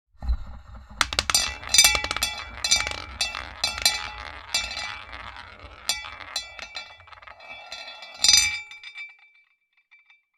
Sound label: The roulette wheel spins; board game casino mahjong